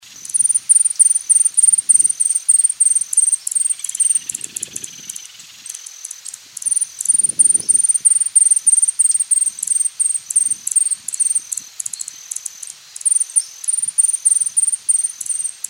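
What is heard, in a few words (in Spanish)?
Picaflor Bronceado, Hylocharis chrysura, Gilded Sapphire; cantos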